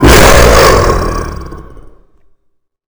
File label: spawn; Dark Village